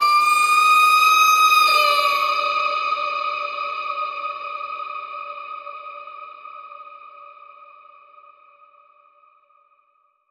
Predator Squeal Bend

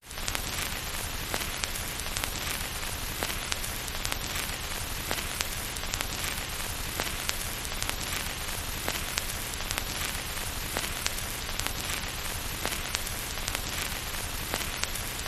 Heavy Record Groove Noise

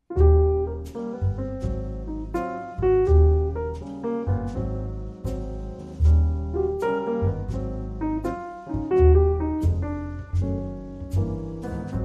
With Trio

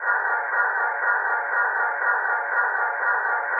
SciFi Noir 120

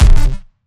Bling Ring Gamer Hit

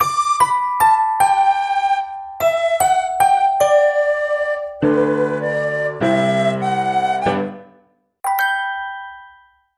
Link Sting

